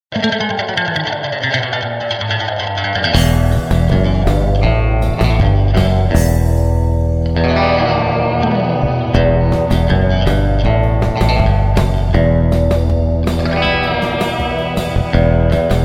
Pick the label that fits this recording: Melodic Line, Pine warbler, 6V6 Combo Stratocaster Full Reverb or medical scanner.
6V6 Combo Stratocaster Full Reverb